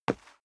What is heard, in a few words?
step sneaker wood parquet slide short; schritte